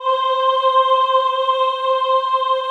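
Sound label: choir C4, Women-Combi; Sound & Vision - Gigapack I CD 2 (Roland)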